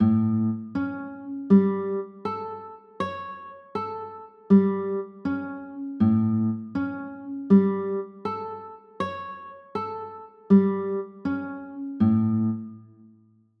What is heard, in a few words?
a 6, chords